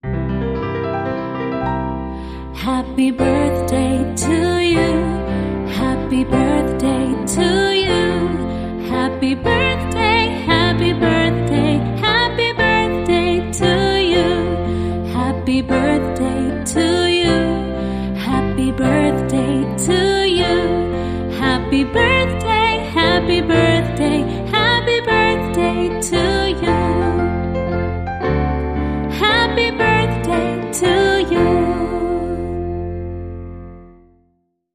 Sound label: Female Vocal with Piano Traditional Happy Birthday